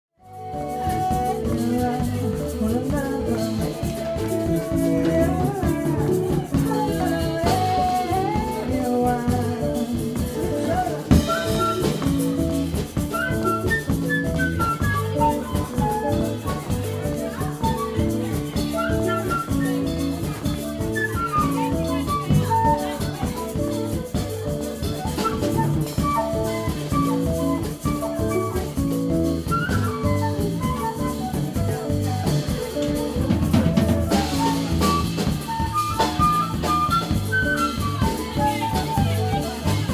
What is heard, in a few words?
drumming